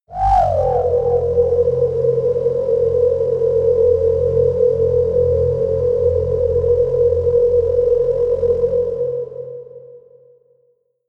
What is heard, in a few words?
air drone whistle, drones; musikelemente